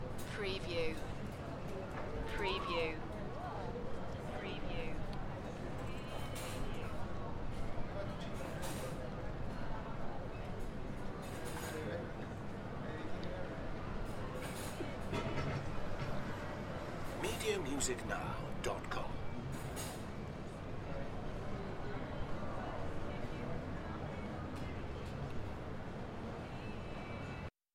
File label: Spanish cafe ambience; beanstalk audio